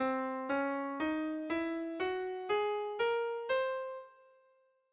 Dom Diminished Whole Tone

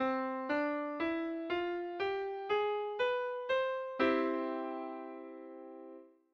Major harmonic b6

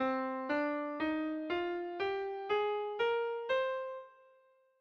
Minor Natural pure

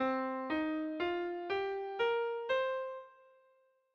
Minor Pentatonik

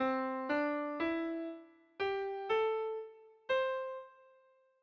Major Pentatonik

